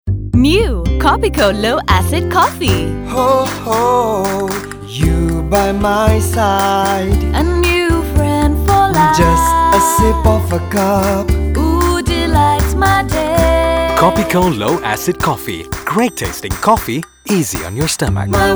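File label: ENGLISH Jingle; Kopiko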